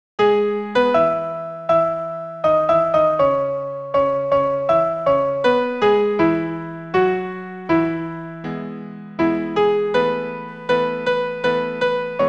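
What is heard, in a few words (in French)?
soprano piano accompagnement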